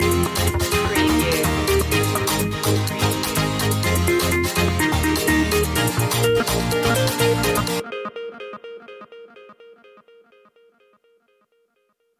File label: wet water loop end; media music now